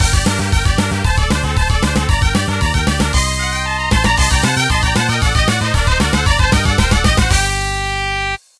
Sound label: midi; music; rostrum; Wacky Wheels